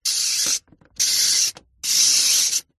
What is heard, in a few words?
household radiator heater portable timer dial turn set sequence